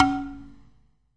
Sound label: Big pot SM, Clay Pots, ethnic and world percussion